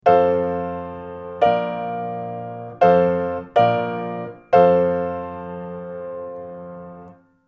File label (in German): akkordfolgen